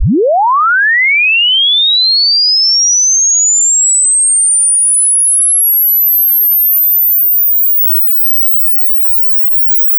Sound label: sweep